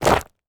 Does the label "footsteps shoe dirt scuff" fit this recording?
Yes